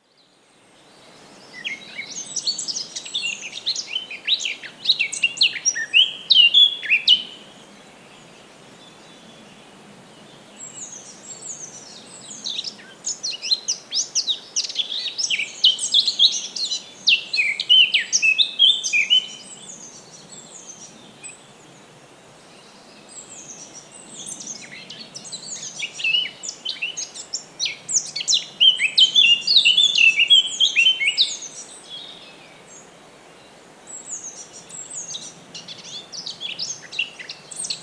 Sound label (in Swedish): svarthatta; fågelljud